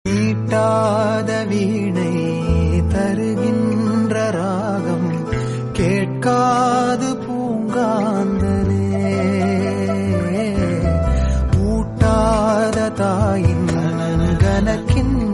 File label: instrumental bgm; kaala; rajnikant